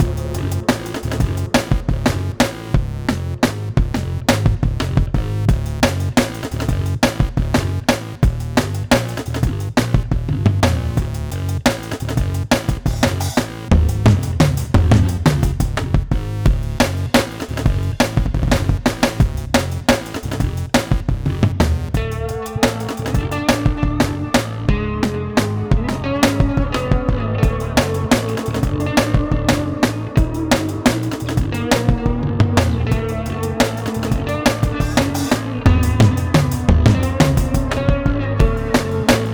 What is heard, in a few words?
Hard Mode Music; 10 Second Ninja X